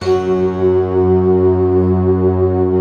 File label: HARPS, Harpsiflute, Harpsi Stack; Optical Media International; Sonic Images Library